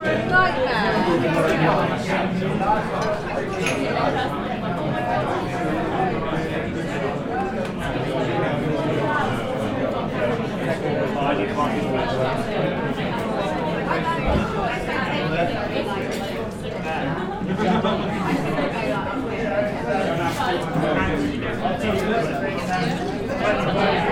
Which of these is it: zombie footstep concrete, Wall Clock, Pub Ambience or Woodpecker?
Pub Ambience